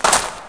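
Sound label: footstep grass; thief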